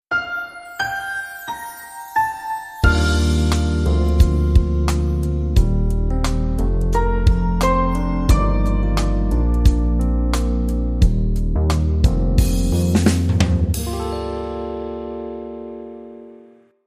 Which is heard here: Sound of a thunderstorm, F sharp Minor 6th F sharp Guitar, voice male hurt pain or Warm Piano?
Warm Piano